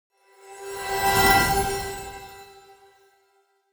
magic transition sweep

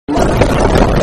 loud vine boom sound

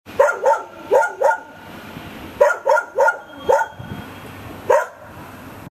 watchdog

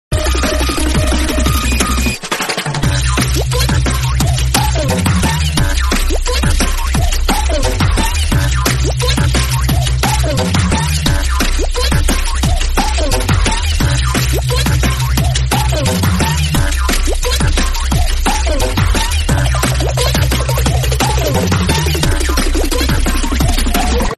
goofy ahh song

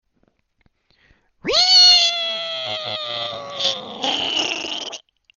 mystery meme

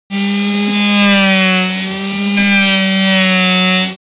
saw cut machine working

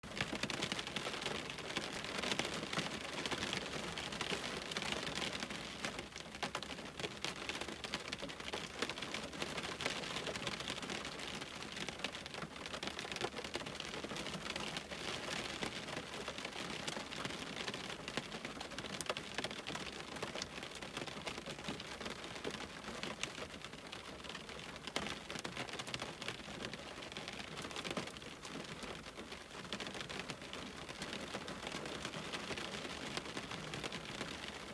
heavy rain hitting window

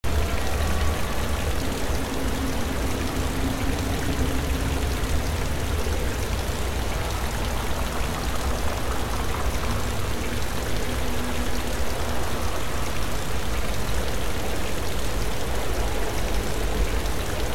rain sound; lluvia

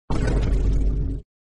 meme sound effect shock

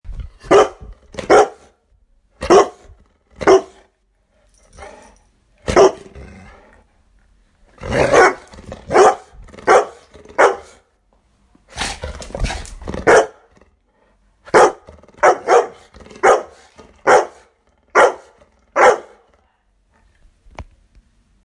dog

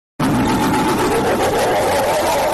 laugh giggle scary

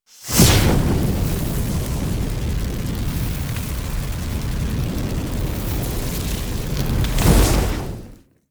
wizard fire woosh

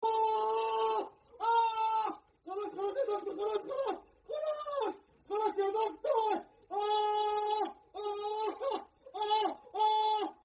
ahh screaming

